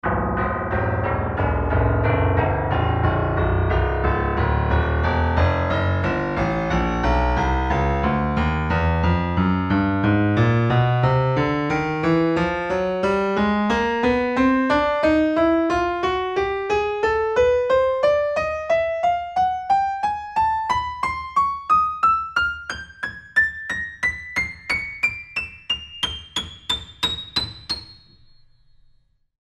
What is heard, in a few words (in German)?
boesendorfer 290 alle toene